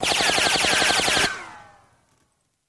Machine Gun Fire Rapid Burst With Bullet By Whooshes; single burst